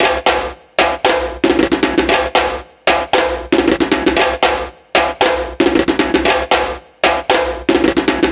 115, Breaks; loops; 115 BPM; Loopmasters; Jon Carter & Alex Blanco; Block Party Essentials

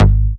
Bass Shot G#

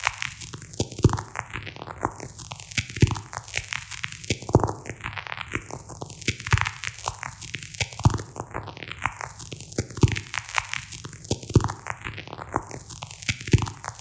Bpm137 Wire noise Fx Loop; Dubstep; FX Loops

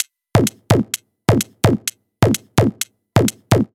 Loop, 128 BPM

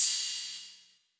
Ride, Cymbals

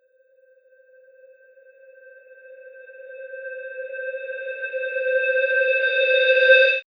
FX Misc

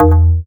Bass Shot D#; Mutekki Media; Vengeance Electroshock Vol.2

